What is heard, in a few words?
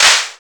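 clap; E-Lab; X Static Goldmine 1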